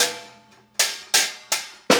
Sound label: Hi Hat Pattern